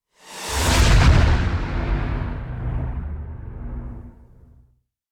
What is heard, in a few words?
Whoosh Explosion Ramp In; Hollywood Edge; sound designer's toolkit v2